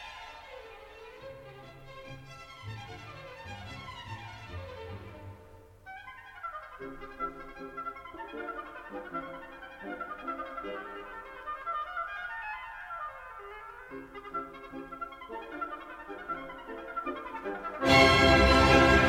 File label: oboe audition excerpt; La Scala di seta